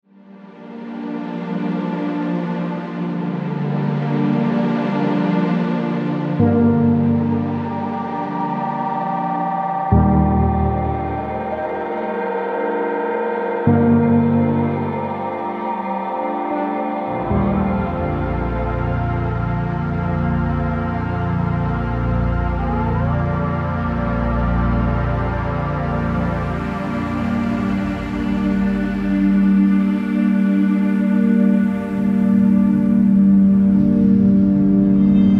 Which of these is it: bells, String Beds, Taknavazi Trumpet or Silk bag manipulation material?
String Beds